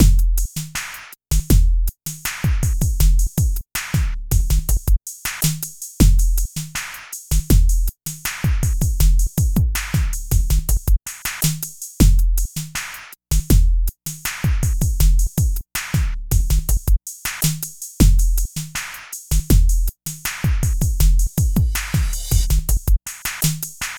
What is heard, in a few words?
Beat, Hip-Hop